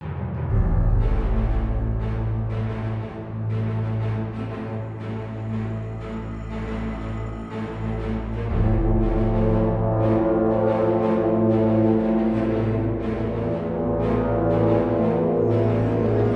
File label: Starfighter Music; Galatic Starfighter